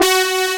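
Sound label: brass G3, synthesizer, analog brass; AKAI S6000 CD-ROM - Volume 1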